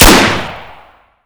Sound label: Inf Weapons; Red Orchestra 41-45